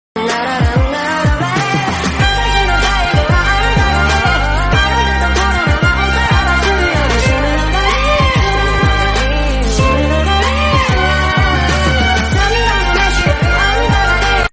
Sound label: VIOLIN RINGTONE